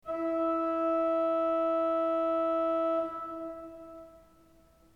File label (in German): ton, orgel